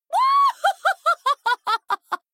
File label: Cackle; Whitemane